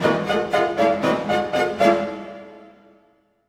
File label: Orchestral Loops; 128 VERTIGO